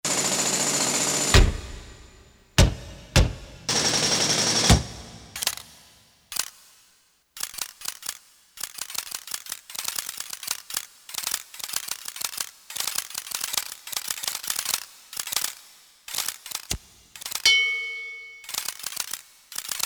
METALS, Korg DSS-1; Sound Tapestry 1

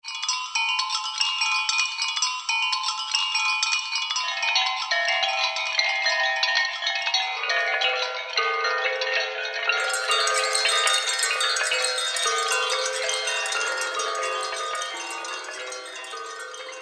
Bells Of Sarna, Roland SR-JV80-05 World

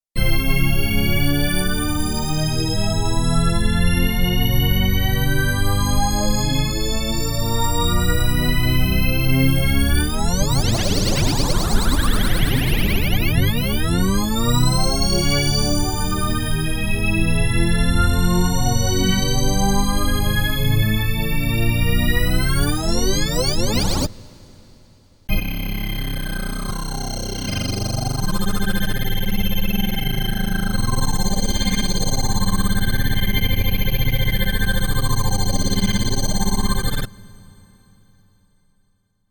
Korg Radias-R

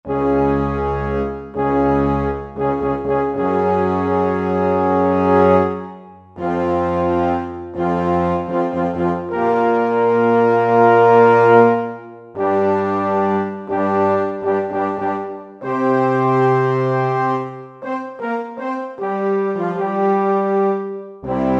Large Horns, Roland SR-JV80 Orchestral

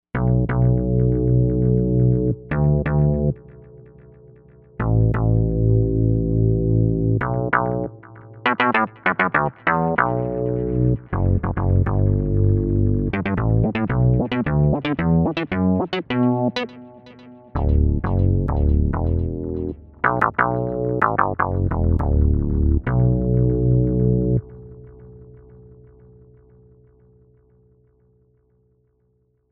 Chorus Bass, Novation UltraNova; Factory Patches

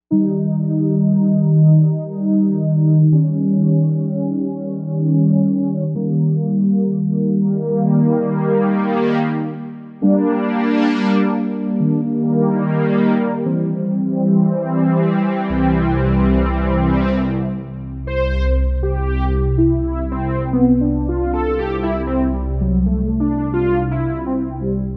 Air Pad, Novation UltraNova; Factory Patches

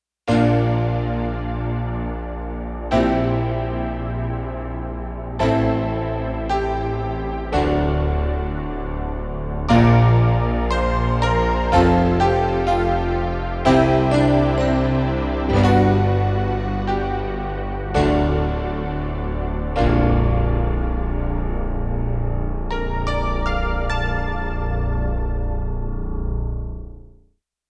Mellopia Str, synth

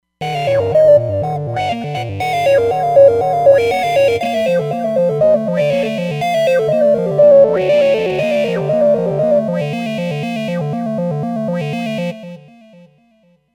ARP x Race, Roland Fantom XR

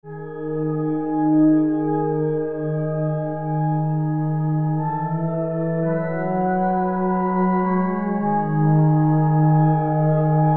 Theater Voice, Pads; Green Oak Crystal

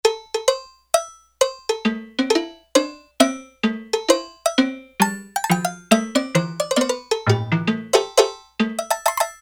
Metal Cows, Roland W-30; Factory Performance Patches; Factory B

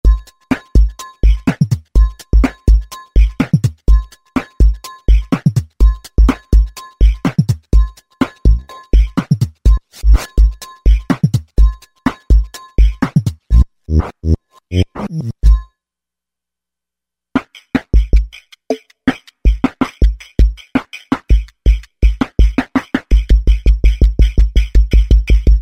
Lo Fi Synth KIT, Roland V-Synth; Factory Patches

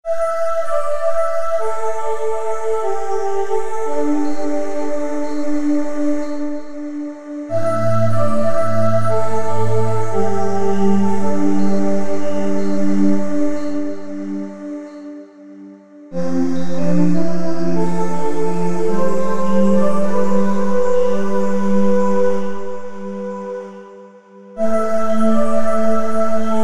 SYNTH TEXTURES; Bondi Breath; Apple GarageBand 2

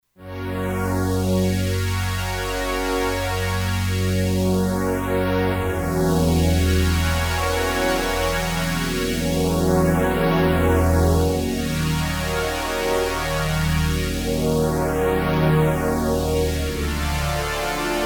Solina Phaze, Kurzweil K2500X; Factory Programs

